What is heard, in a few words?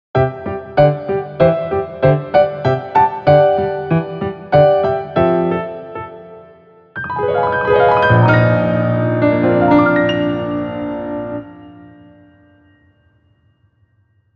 Skill Learning Jingle; bayonetta origins cereza and the lost demon; Snack Time; Cheshire